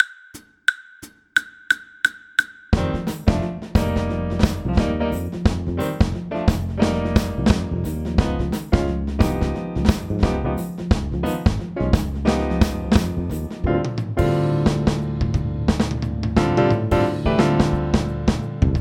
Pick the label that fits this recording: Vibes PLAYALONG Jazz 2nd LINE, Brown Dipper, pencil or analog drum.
Vibes PLAYALONG Jazz 2nd LINE